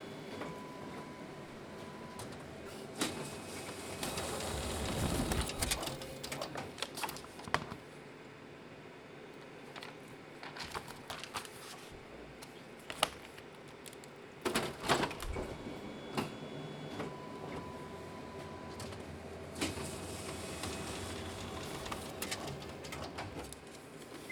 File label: photocopy; disaster archive; FUKUSHIMA